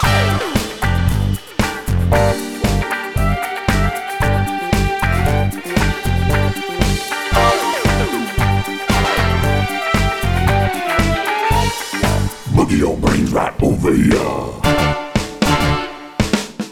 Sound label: mus disco cinematic; zombie; Plants vs. Zombies Battle for Neighborville